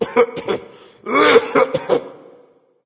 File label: clear throat; smite; kuzenbo